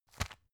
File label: tissue package put down